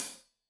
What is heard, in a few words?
Hat Loose, Hi-Hat, Cymbals Stereo